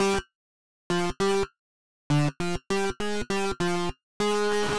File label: Synth Line 100 G, hiphop 100bpm; musicradar